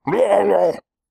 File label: Angry; Amanita